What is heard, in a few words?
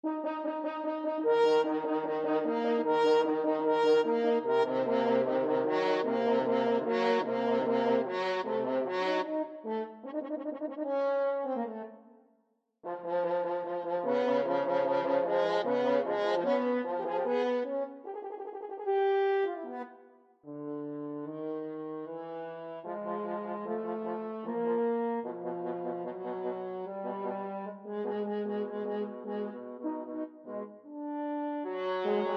french horn duet